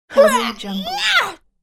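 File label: girl combat shout; جیغ دختر رزمیکار; human sounds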